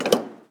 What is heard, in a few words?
winch Med Lock; Kerbal Space Program; KAS